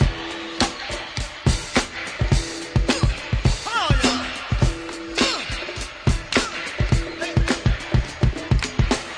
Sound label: 105 with vox, drum loops and breaks